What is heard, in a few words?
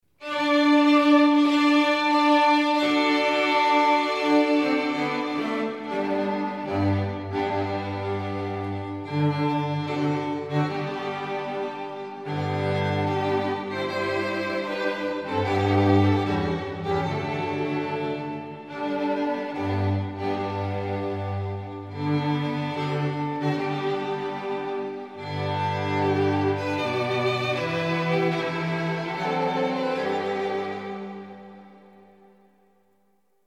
String Quartet